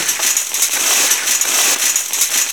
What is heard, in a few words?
metal chainlink scrape rough loop; physics